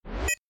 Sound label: computer answer click